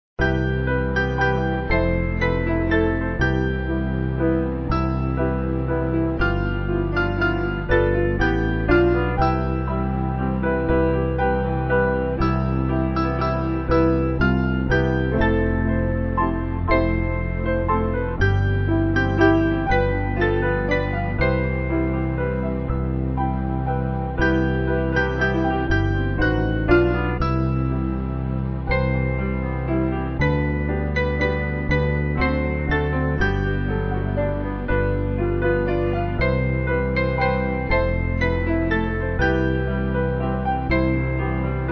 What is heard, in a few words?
Piano; Soft As The Voice; Whispering Hope